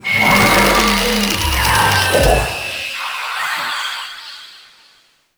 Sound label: Wraith Possess Start; Enemies; Warframe; Zariman